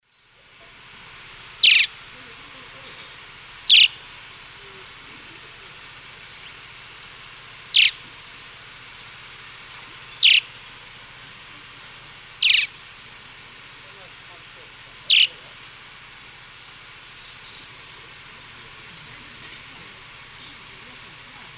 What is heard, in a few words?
Progne chalybea, calls from perch; S. America; Eastern Lowlands